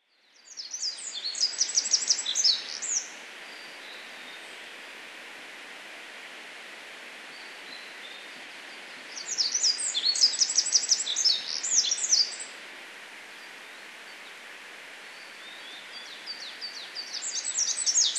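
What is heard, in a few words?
olive-backed pipit; binzui; kiso; koe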